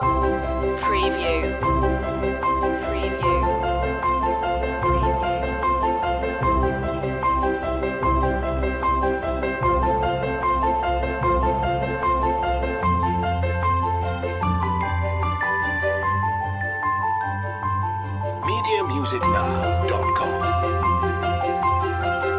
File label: building blocks loop